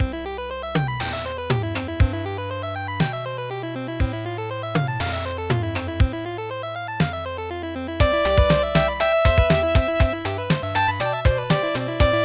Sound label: 8 Bit; Press Start Screen